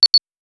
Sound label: a sharp high pitched rada